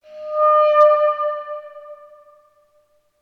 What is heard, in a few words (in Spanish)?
clarinete nota decay